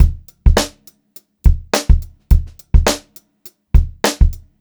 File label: Funk Groove Drumloops; USB Soundscan vol.38; AKAI